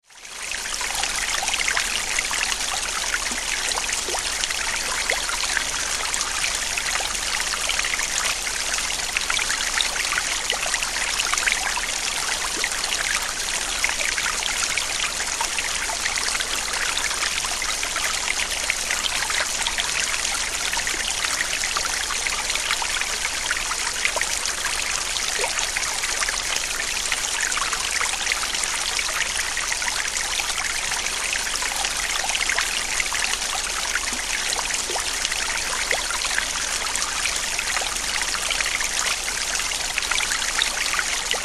Bachplaetschern

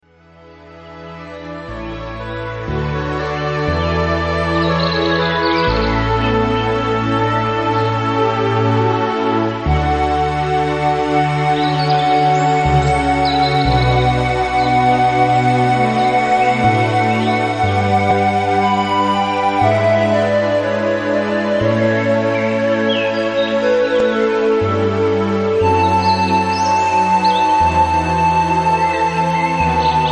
Fruehlingsmorgen Entspannungsmusik

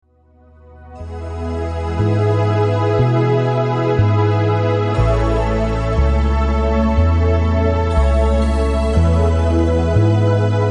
Sonnenlicht Entspannungsmusik

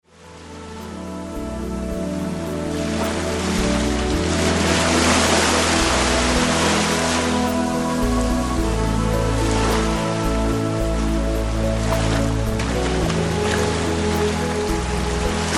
Leichte Brandung mit Musikuntermalung

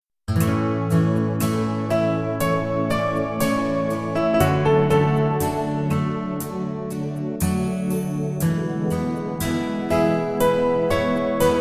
Harmonie

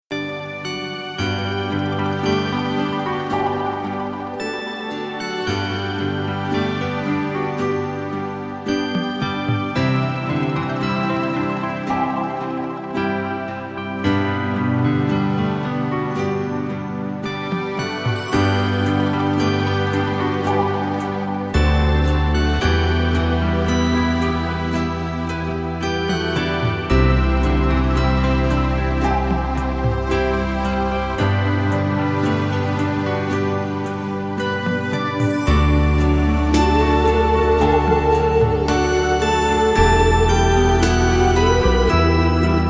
Wiegenlied

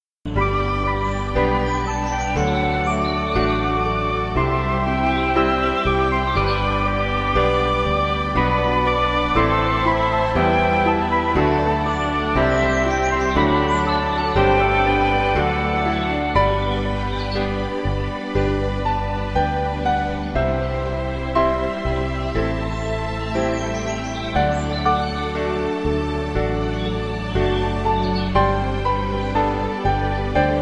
Sonnenaufgang

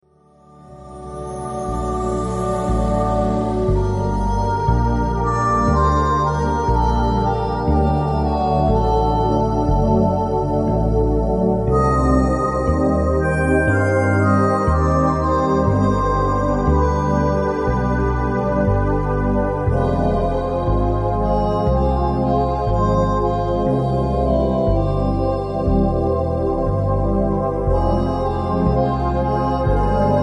Sommerabend Entspannungsmusik

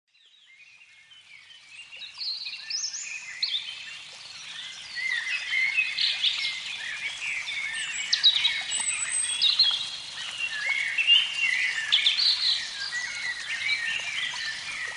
Vogelzwitschern

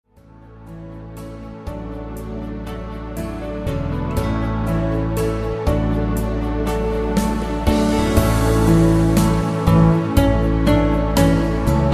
Harmonie

